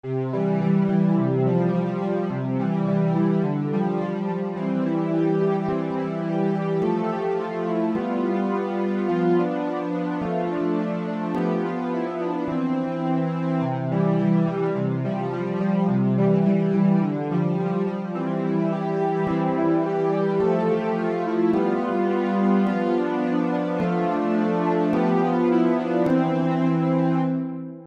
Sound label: instrumental; Pekarcic